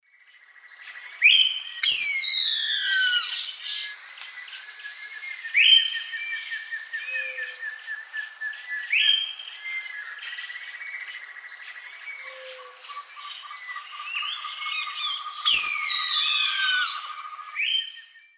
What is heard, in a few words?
Cacicus solitarius, call or song; S. America; Eastern Lowlands